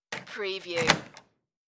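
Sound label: Door Lock Open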